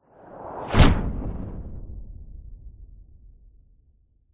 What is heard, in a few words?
science fiction spacecraft ultra fast fly by boom; zapsplat